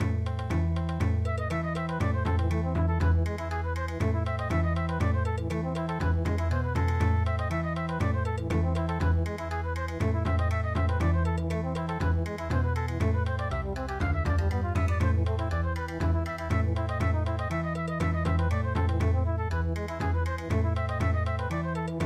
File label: Bon Odori